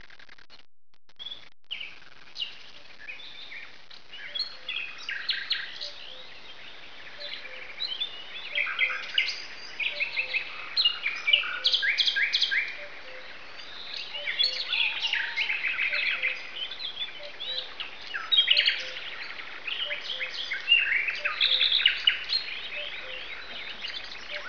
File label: birdsong